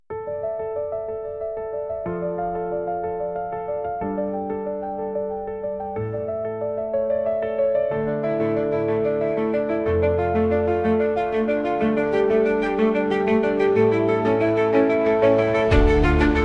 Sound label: Electric Cello Dream